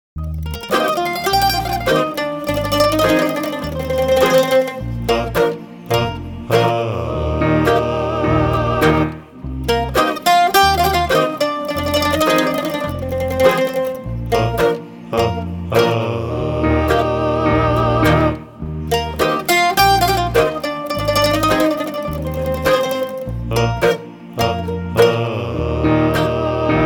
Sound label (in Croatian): Akuzaj instrumental